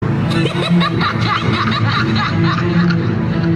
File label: Laugh